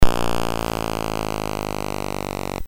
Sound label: BASIC sid MOS6581R3, GAMES; Terrible Twins